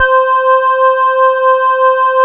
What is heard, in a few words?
Korg POLY800 C4; Back In Time Records; Korg Universe VOL-1